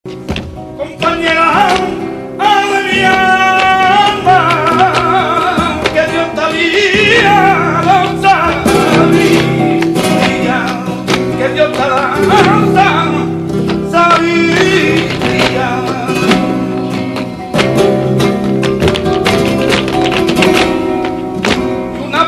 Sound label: flamenco